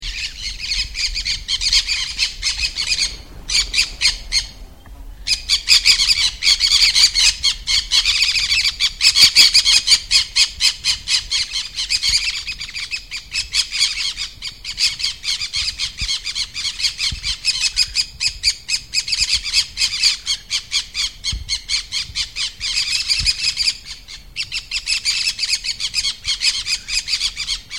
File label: Green-cheeked Conure